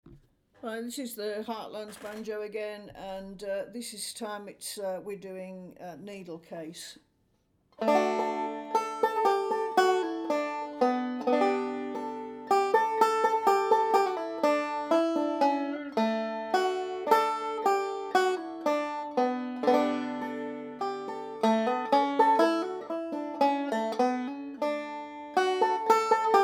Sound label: Clawhammer, banjo; Heartlands